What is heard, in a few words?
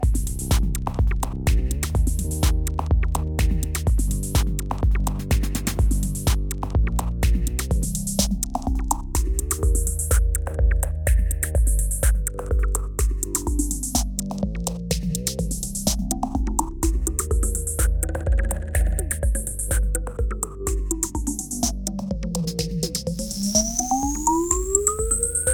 phaser